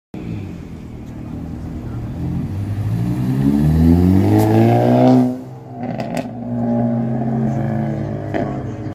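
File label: Hyundai Elantra, exhaust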